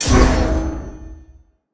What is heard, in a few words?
activate metal; Smite; Cabrakan